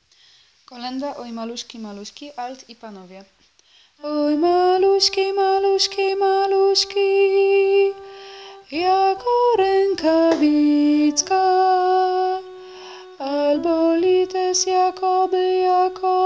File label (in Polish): Oj Maluski alt i panowie; Boze Narodzenie